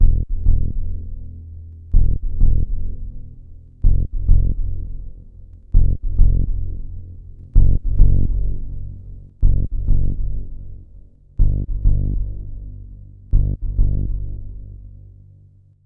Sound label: Ditty; NBA Courtside 2002